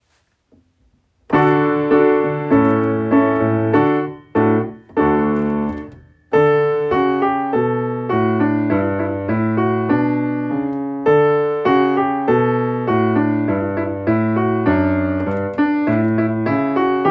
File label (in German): Klavier; Haensel und Gretel